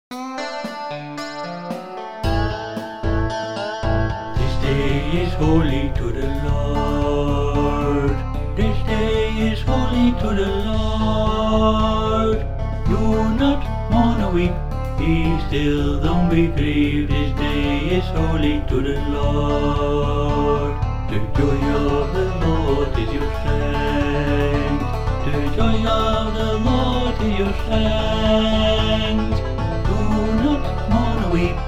country; New Hope Music

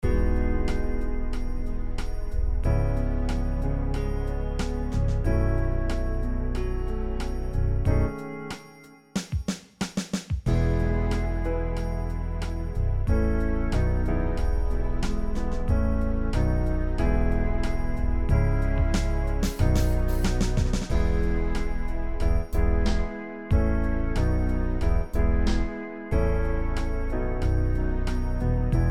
backing; New Hope Music

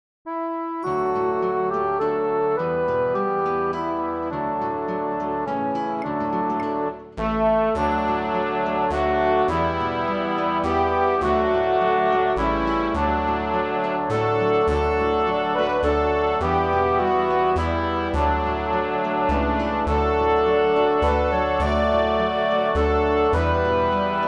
Doxology Round instrumental; New Hope Music

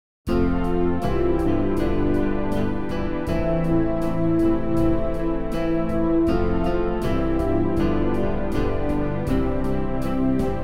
backing; New Hope Music

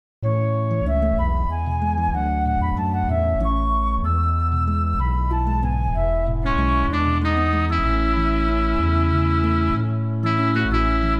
instrumental; New Hope Music

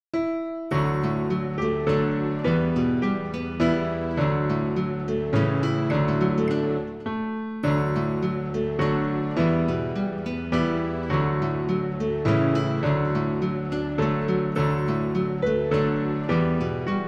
Sun And Shield Round backing; New Hope Music

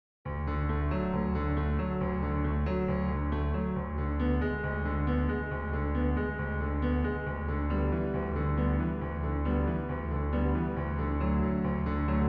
instrumental; New Hope Music

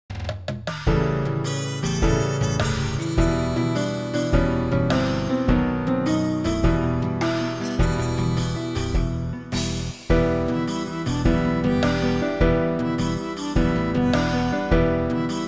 instrumental; New Hope Music

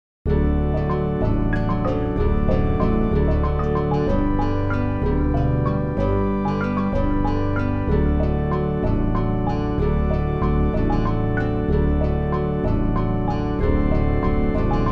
That Ye Might Walk backing; New Hope Music

